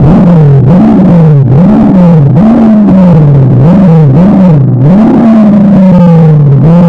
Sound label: boosting turbo power engine rev ups